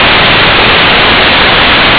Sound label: white noise; mathematical noise